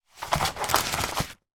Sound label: game money notes scoop or pick up from playing board; monopoly